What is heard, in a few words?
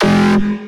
Stab; neuro; InsideInfo